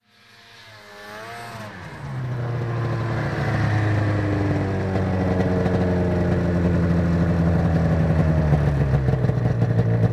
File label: Snowmobile Stop